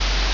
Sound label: ambience room tone